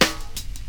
00s verby snare drum sample g sharp key; snare drum one shots

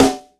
natural snare one shot c key

